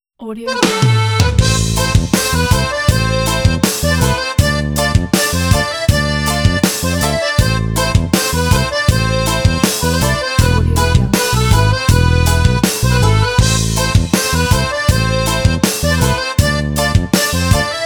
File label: festive ramadan eid; soundbounce